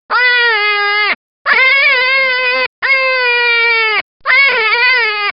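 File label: unknown hare cry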